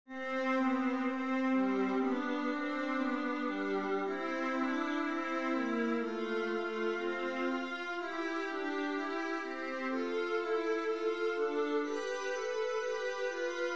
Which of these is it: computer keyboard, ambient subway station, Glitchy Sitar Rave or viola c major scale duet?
viola c major scale duet